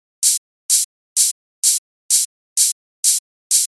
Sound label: Drum Set 128 LP Hat Levare